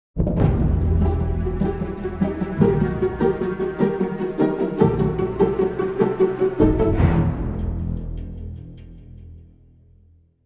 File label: String; Assassin's Creed Unity Dead Kings